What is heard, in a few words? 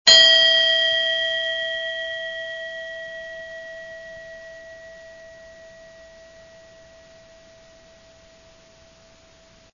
bell